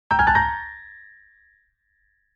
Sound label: Woodwind Line